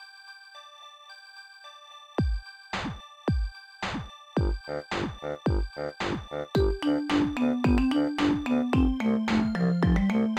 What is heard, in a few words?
Ambience; Ringling Bros. and Barnum & Bailey Circus Friends Asian Elephants